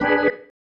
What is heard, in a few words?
Stab One Shots, Organ